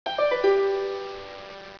harpa

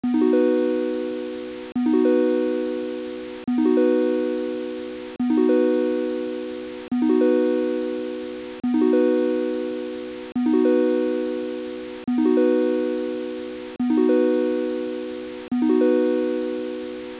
triangular Loop

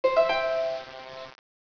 harpa

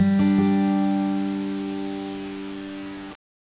guitarra

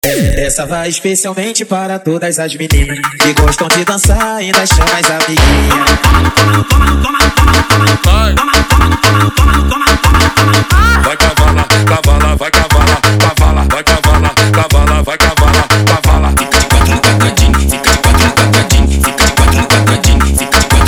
loops na pressão

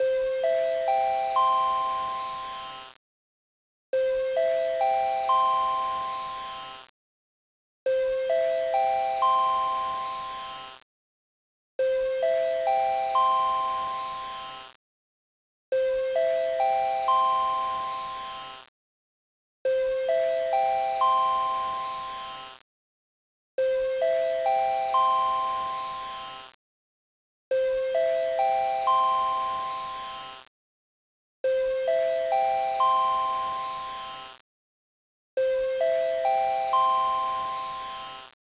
hipermercado Loop

